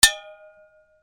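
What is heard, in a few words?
percussion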